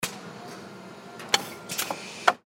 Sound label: Pneumatic Cutter; Machinery; Machines; INDUSTRY; Blastwave